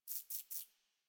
Forest Rodent Vocal Idle; Characters; Warframe

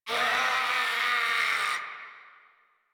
Infested Runner Attack; Warframe; Runners

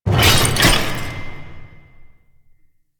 Customization UI Equip; Veilbreaker; Kahl

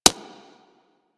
Infested Minion Step Default; Warframe

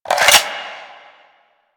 Reload; Gotva Prime; Warframe; weapons

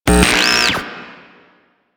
Screen Static; Cephalon Tweacher; Warframe; Quests; The New War

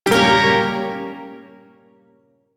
Shawzin Phrygian Chord; Warframe; Duviri Shawzin

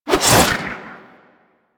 Board Sword Throw; Sundial

